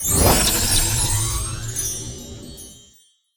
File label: butter respin; butterflystaxx